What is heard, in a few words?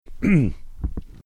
clearing his throat